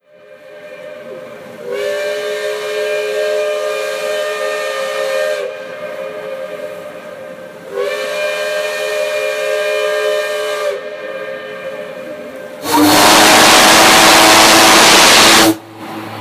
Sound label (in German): Schiffs Begrüßungen